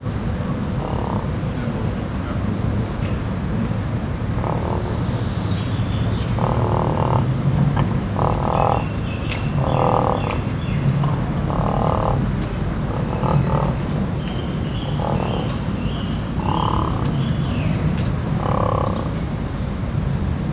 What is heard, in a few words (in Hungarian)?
horkantgat, törpe víziló; szegedi zoo 2011